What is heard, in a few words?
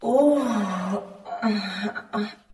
female orgasm